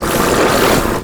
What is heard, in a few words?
imp attack melee, monsters; Doom